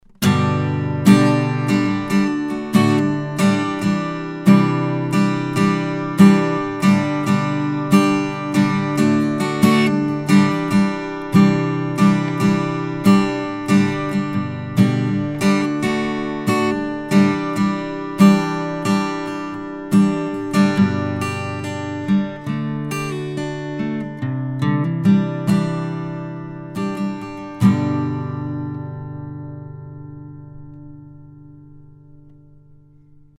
Acoustic Guitar; mic shootout

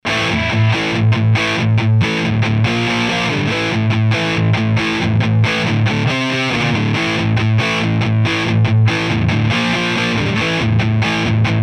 AMP DIst; Clarion

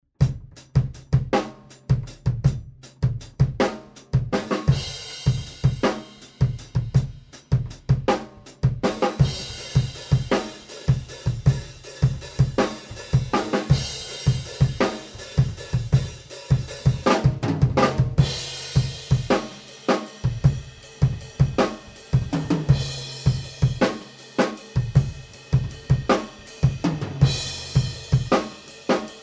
Room Mics R122

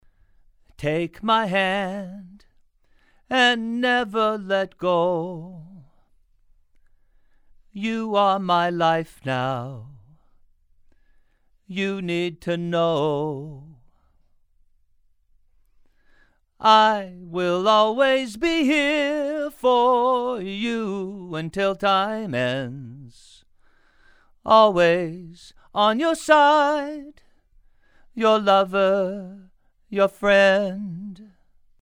Male Vocals

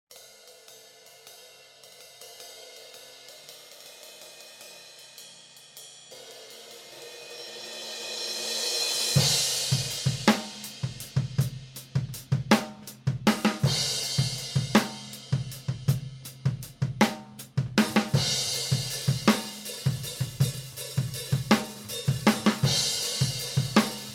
Drum Overheads; Audix i5; Dynamic

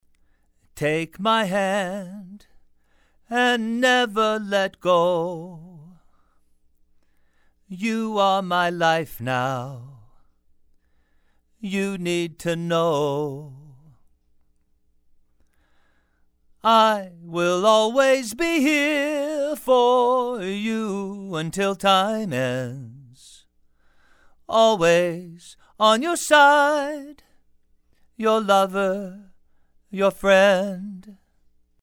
Male Vocals Oceanus

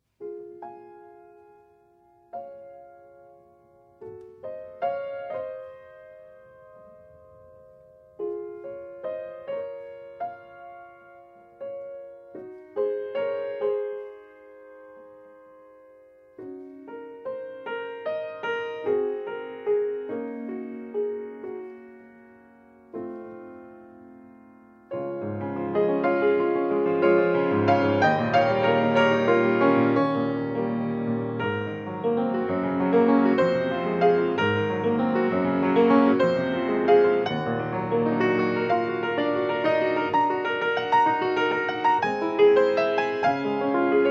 Piano

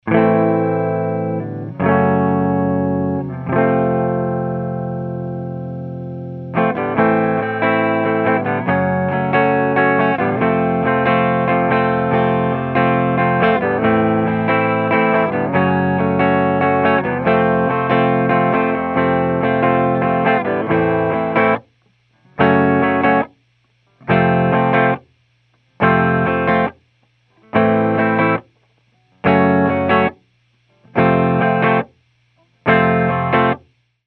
AMP Clean RNR1, clean eguitar; SE Electronics RNR1; Ribbon

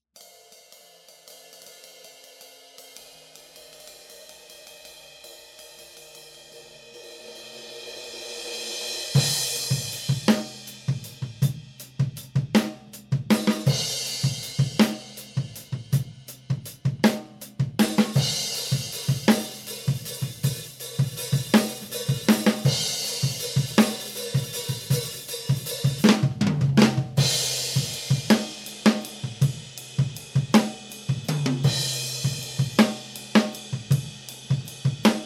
Drum Overheads D2

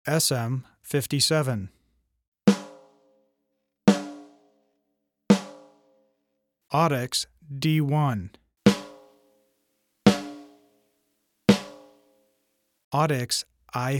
snare; mic samples

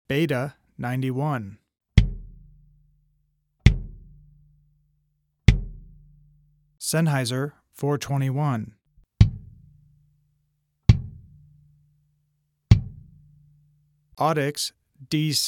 kick; mic samples

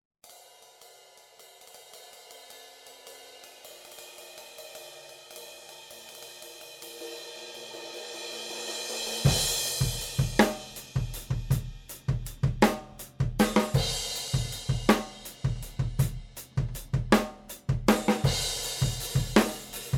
Drum Overheads U67

